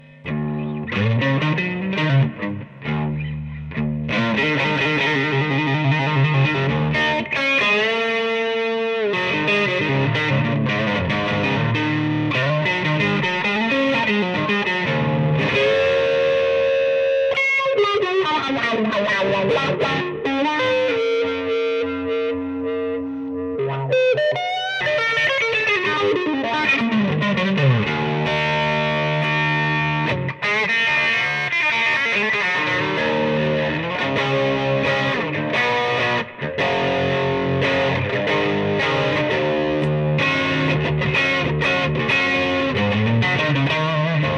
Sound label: overdrive wha